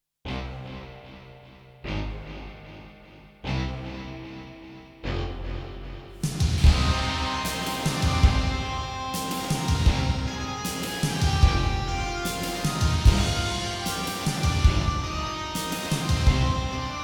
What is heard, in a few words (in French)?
Musique film; IUFM